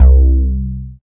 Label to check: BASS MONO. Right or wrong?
Right